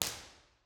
ir response nastri; magnetostrittivi

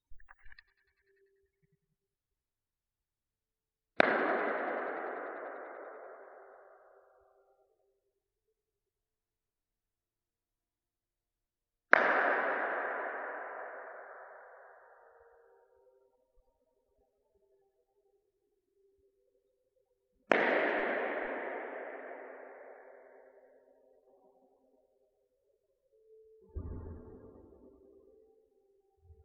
Palestra denoised; Castellazzo; Isomec

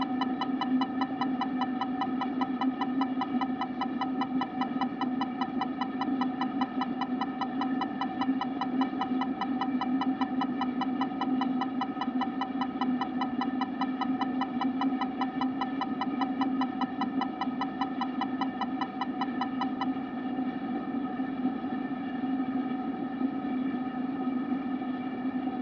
burst 16ms; LaSpezia; Da Sorg1 a Mic1-2; CESI

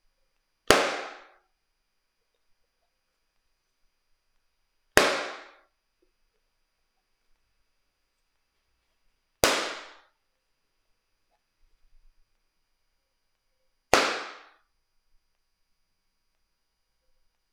clappatore

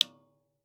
ir magnetostrittivo, dml vetro; magnetostrittivi

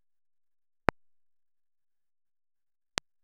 Martello PCB punta media Legno Marmo; Prove Martelli

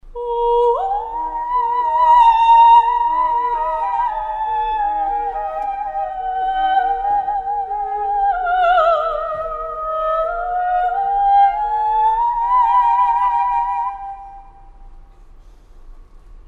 Lucia Assolo

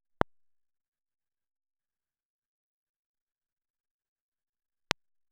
Martello PCB punta piccola Legno Marmo; Prove Martelli

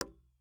ir sub legno, dml legno adesivo; magnetostrittivi

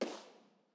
IR palloncino, Teatro Benevento

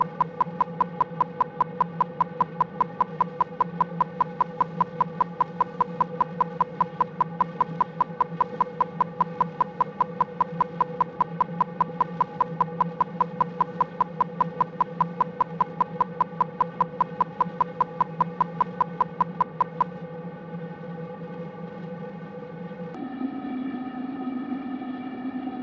burst 16ms; Da Sorg1 a Mic4; LaSpezia; CESI

